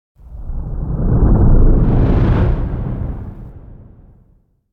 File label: Whoosh Heavy Movement Deep And Slow Distorted Whoosh; Hollywood Edge; sound designer's toolkit v2